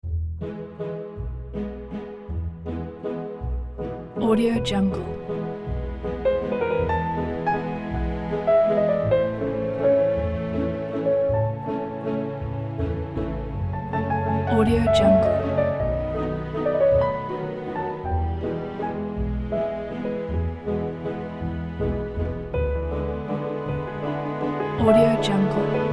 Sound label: moonlight waltz; adigold